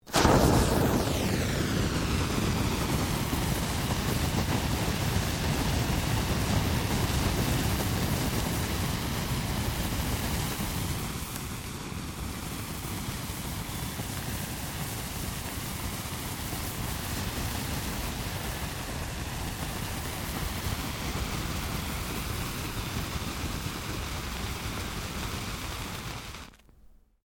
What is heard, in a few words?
Fireworks Sparkler Jumbo, Domestic Fireworks; Fireworks (Sound Effects:Backgrounds)